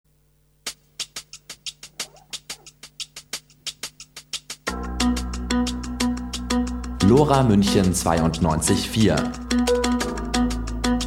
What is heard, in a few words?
Jingle; ABF; Attac; LoRa